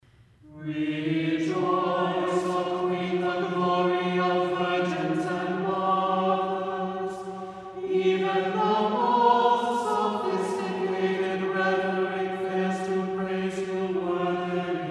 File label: Pentecost Irmos Simple; DivineLiturgies